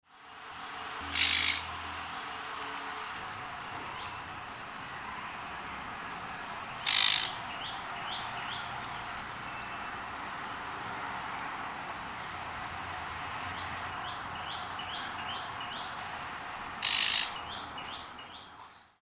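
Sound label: scold Blyth's Reed Warbler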